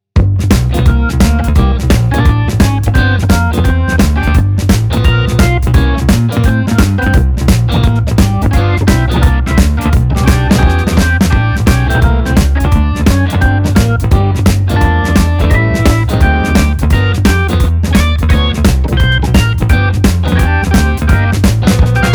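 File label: 172 bpm, blues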